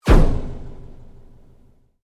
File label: Sweep Mechanical Fast